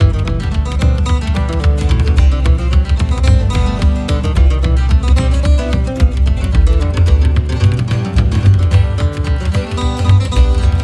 Celtic Irish Reel with Bodhran and Bouzouki G 118 110 bpm; Lough Neagh